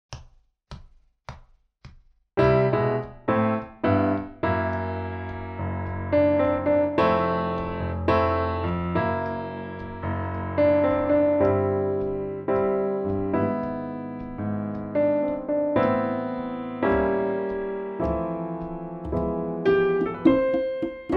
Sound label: minus bass